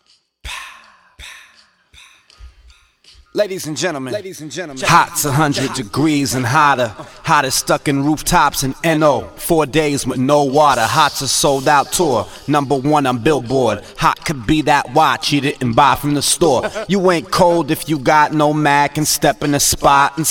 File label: dirty acapella